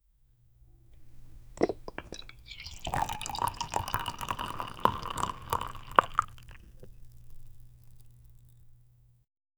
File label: pouring a soda